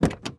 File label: open; Mafie 1